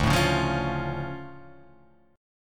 D Minor Major 7th D down; DmM7 strummed; JGuitar

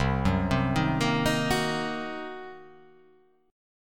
C Minor 7th Flat 5th, chord

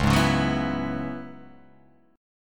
Db Minor 11th Db; Dbm11 strummed; x,4,6,6,6,6,6,x; JGuitar

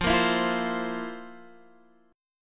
F Major 7th Suspended 4th, Guitar, Open D; down; chord shape

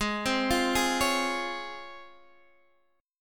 Db Major 7th Ab

